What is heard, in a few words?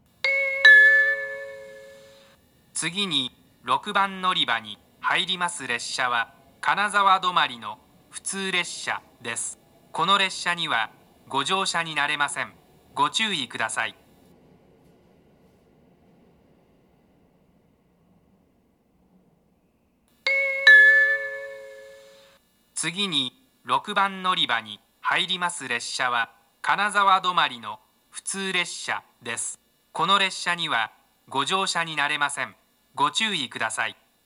kanazawa stop local; station